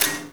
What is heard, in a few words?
menu invalid; Counter Strike Model Pack; CS:GO